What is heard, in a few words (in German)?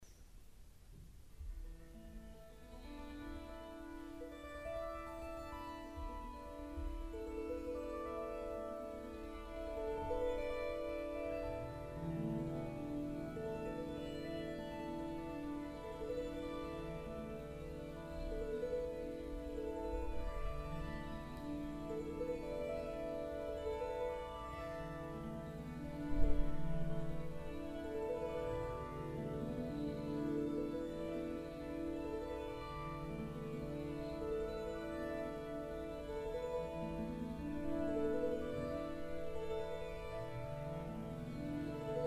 Klangharfe